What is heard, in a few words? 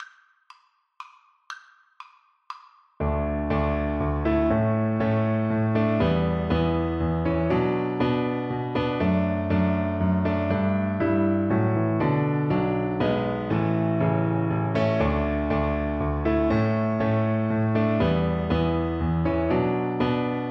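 chaconne VLC karaoke, Cello; fairy queen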